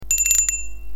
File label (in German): Fahrradklingel Oversized Riffelrand